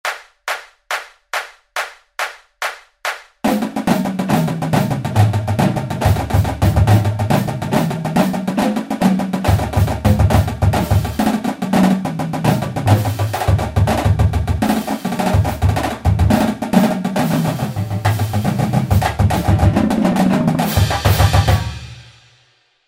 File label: Flams 140